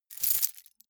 key ring large rattle air; objekte; schluessel

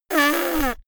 little girl snort; soundpool

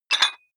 dumbbell pull up rattle, fitnessstudio

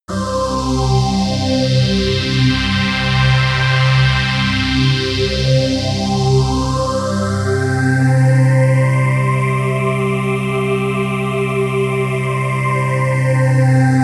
pad sweep flute; flaechen

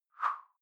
whoosh simple slash big high; swooshes-swishes

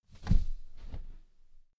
wing flap low; natur-tiere; voegel

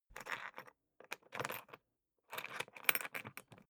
briefcase plastic latch lock key turn ringing; industrie wirtschaft; bueroarbeit

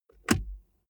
car bmw e46 interior center console close

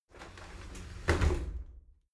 shower slide doors close soft; objekte; tueren

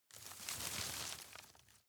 rustle soft

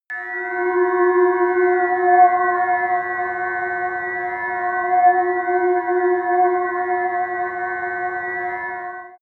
sci-fi ambient scanner high, drones

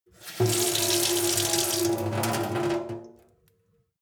sink water flow medium seep; industrie-wirtschaft; gastronomie

